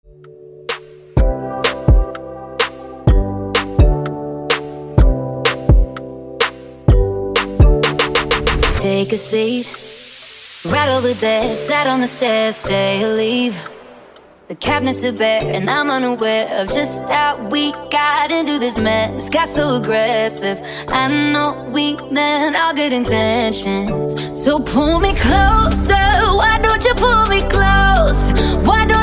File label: Transition Extended Redrum